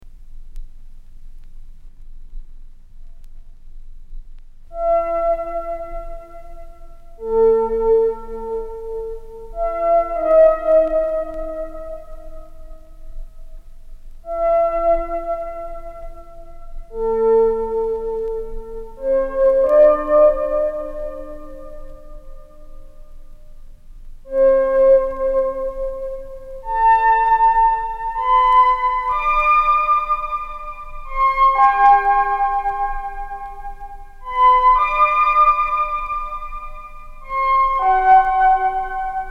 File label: solo underscore tune